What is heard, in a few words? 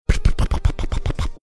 making split flap display sounds